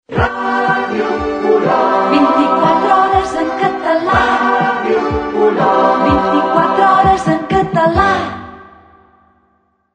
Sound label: JINGLE RADIO; OLOT; 1976